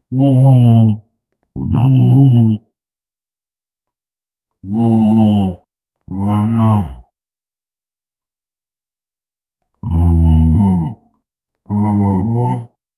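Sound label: native american powwow vocals; ceremonial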